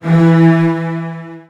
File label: E2, Virtuoso Strings, 16TH; ILIO; Kirk Hunter; CL 16TH